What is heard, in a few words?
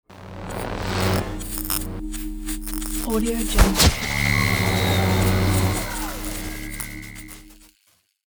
electricity lightning storm cast